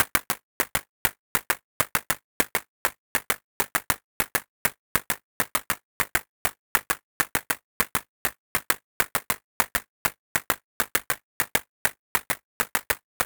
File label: Clapping Music; Exportation audio de LMMS